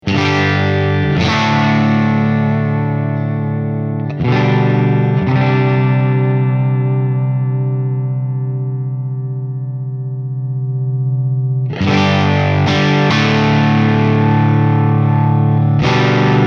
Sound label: fender 57 deluxe